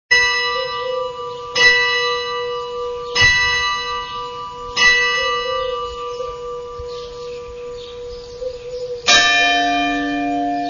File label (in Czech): biti hodin; dubne